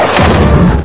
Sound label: houndeye blast; Source Engine; bshift